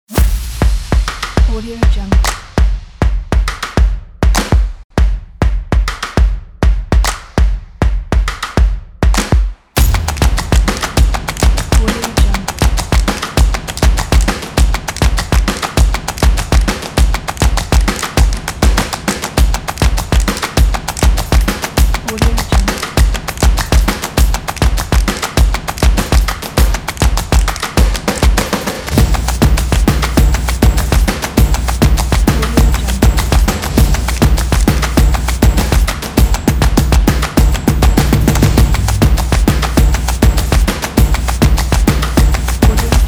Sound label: percussive energetic stomp